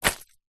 purse pouch leather containing coins and notes catch; foley